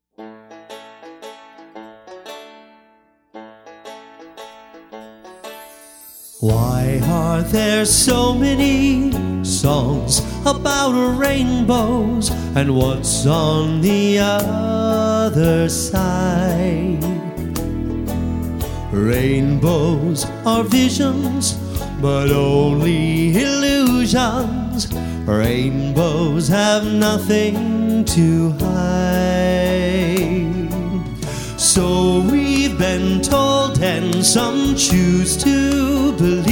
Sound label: singing